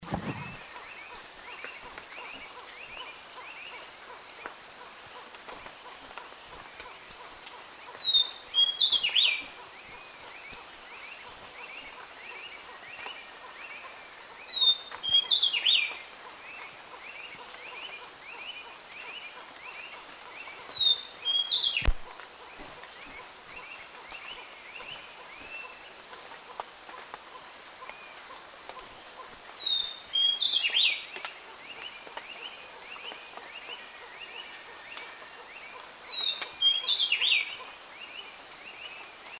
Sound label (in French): ariane a ventre gris, chant